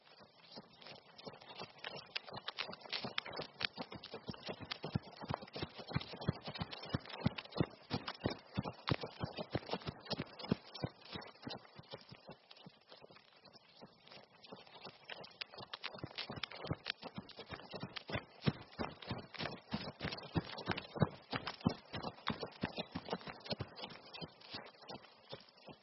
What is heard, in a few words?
Sport Kids Xcountry